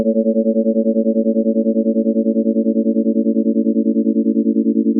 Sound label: wave